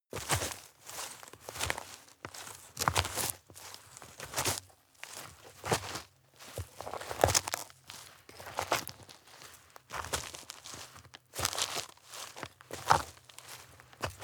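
step dirt and leaves heavy boots walk slow, footsteps; schritte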